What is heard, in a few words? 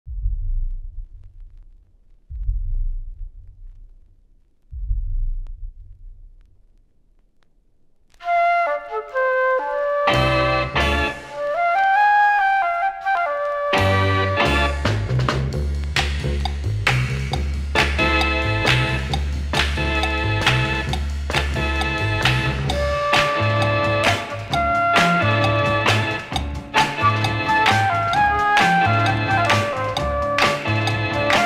Soul, Funk & Yé-yé 45s